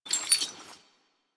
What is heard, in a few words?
Investigate Putdown Shackle; Warframe; Cephalon Weave